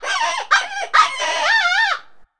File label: Predator Call Jack Rabbit; Weapons